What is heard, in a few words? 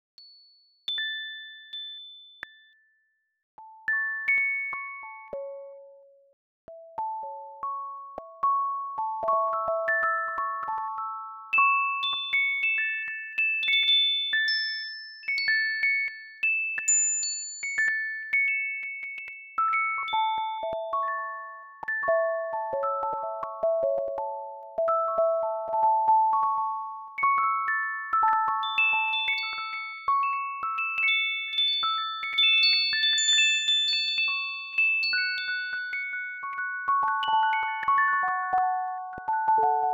rain long, synthesizer; lange-nacht-2009